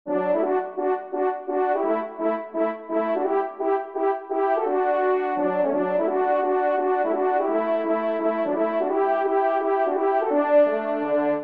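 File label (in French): duo, ensemble, trompe cors; Kling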